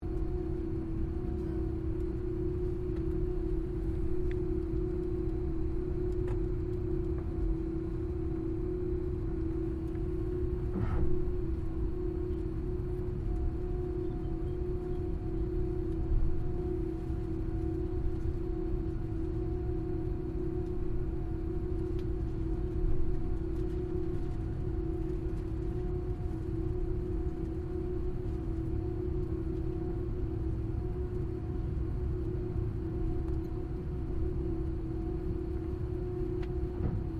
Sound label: AIRCRAFT A320 AIRBUS IDLE INTERIOR; Airplanes; Vehicles